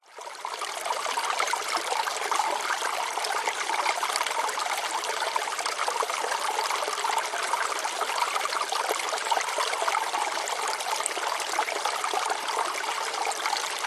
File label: nature waterfall cascading water trickle rocks medium flow; zapsplat